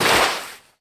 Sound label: Baseball Slide Dirt